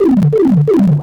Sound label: Warp Pipe Under Water; Wii